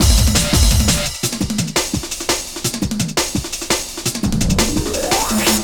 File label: Swift Beat, Drum & Bass, Beats & Breaks; Fluid Dynamics